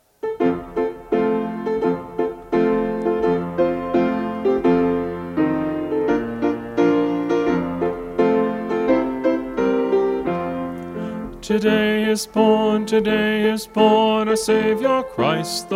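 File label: Jingle Bells Psalm, Choir Practice Tracks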